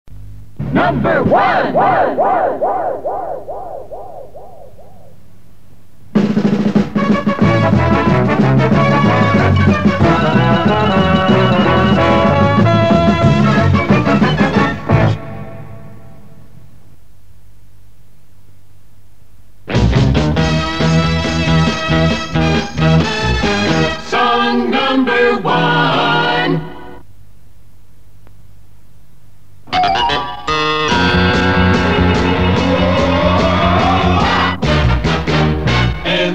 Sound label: various jingles